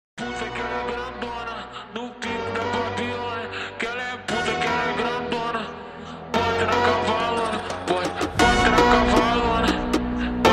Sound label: Funk Jumpstyle slowed